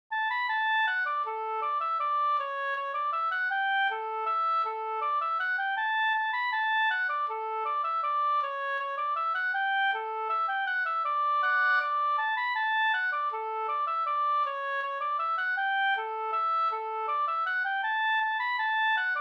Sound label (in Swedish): reinlender